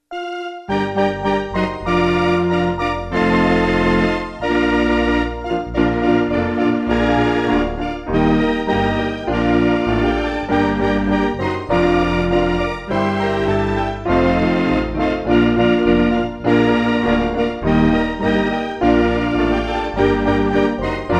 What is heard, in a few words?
instrumental reference mix; I've Anchored In Jesus